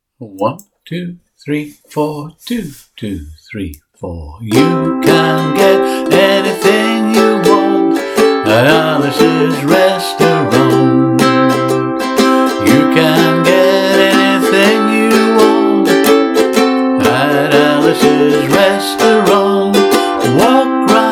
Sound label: Strum